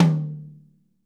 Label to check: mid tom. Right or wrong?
Right